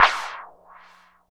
MOD CLAP; East West; Phat & Phunky

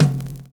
TOM FILL; Univers Sons

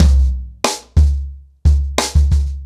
90BPM, ACOUSTIC DRUMS

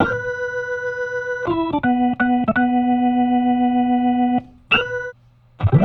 Soul Organ Riff, 70s Analog Funk

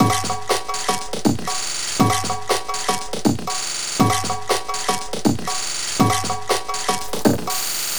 Cowbell Beat, Composite Beats; Sonic Foundry (Sony Creative Software); Myths of Technology

